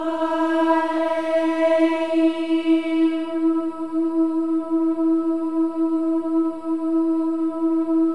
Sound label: AH EH; Spectrasonics; Symphony of Voices; AH-EH-EE